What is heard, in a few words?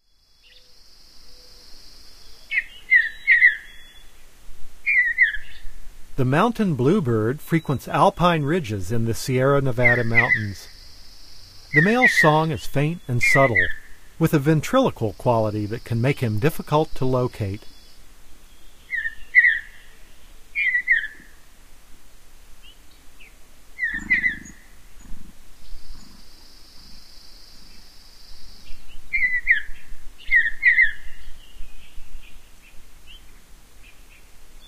Mountain Bluebird; North America; Common Western Species; Know Your Bird Sounds